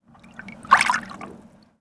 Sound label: cauldron stir